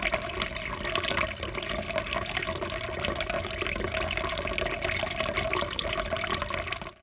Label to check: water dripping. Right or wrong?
Right